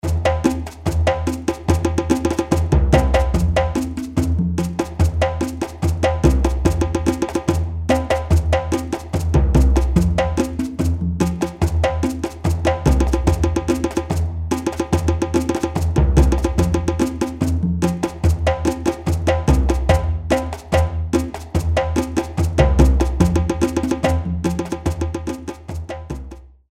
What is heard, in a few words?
Cumbia Colombiana, trommeln